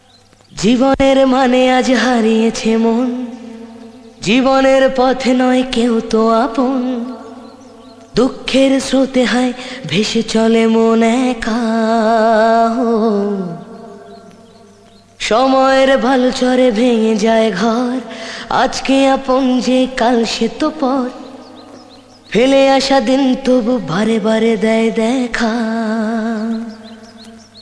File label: Bengali Sad Song Ringtone; ringtones